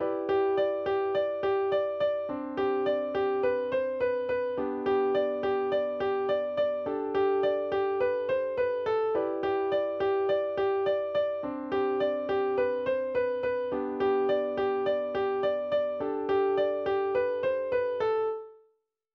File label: instrumental